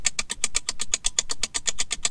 Clock Move; Game rip; MiSide

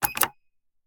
Button Safe; MiSide

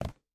Mobile Drop; MiSide

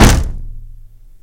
Door Creepy Hit; raw game audio files; Mita; MiSide

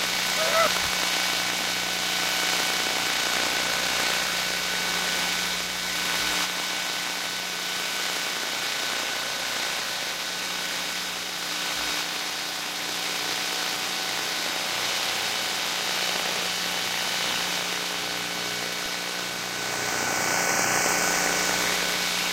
Radio Noise; MiSide

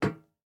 Step Iron; Game rip; MiSide

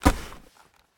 Player Fast Sit; Game rip; MiSide

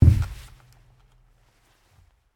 Body Wall Hit; Game rip; MiSide

